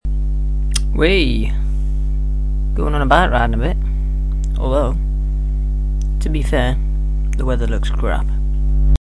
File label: biking